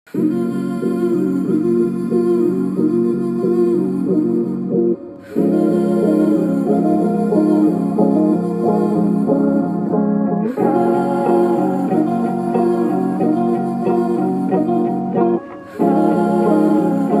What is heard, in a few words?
SONG TONE